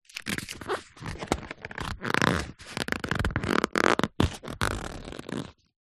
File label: household book telephone directory handle squeak